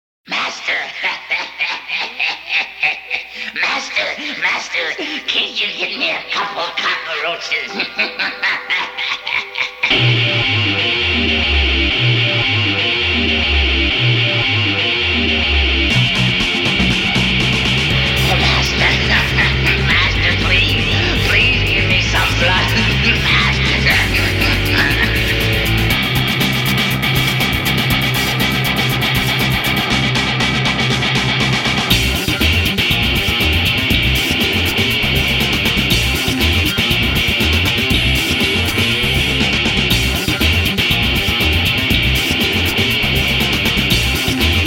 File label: click click click romance keys; Halloween